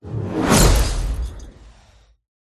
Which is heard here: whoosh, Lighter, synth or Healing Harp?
whoosh